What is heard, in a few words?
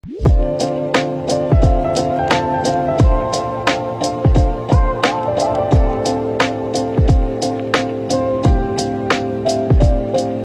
bg music